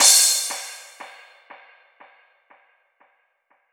Crash, Cymbals

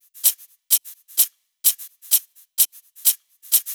Loop, 128 BPM

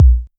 KICK, DRUM HITS; Dubstep; Loopmasters; The New Sound Of Dubstep

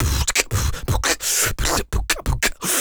Human Beat Box, Drum & Bass; Loopmasters

